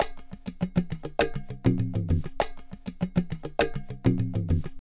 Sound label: Tabla, 100 BPM; Zero-G; Pure Tabla